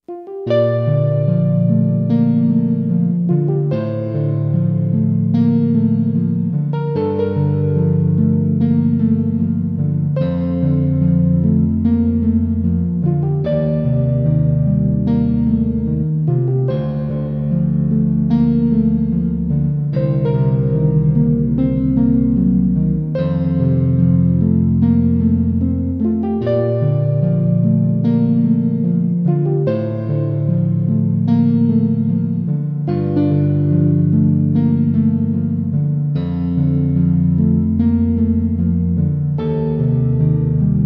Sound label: theme (piano); Otogizoushi Ongakuhen Original Soundtrack